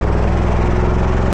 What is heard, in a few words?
move; desert racing